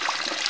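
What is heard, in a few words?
fountain water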